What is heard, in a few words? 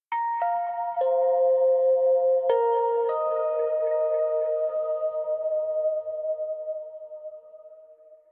Tension Fill; nova 111; ps vita